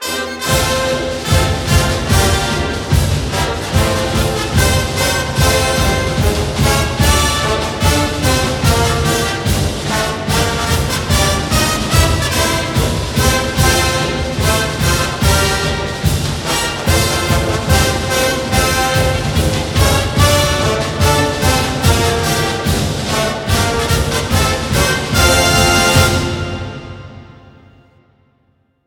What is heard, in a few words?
Broadcast Fight Song